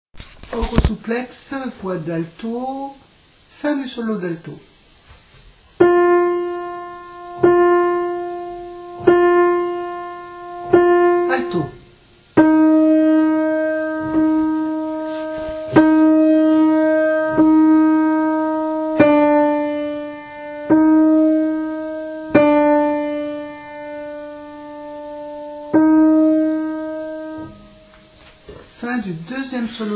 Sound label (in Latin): Oro supplex, Alto; Niccolò Jommelli, Missa pro defunctis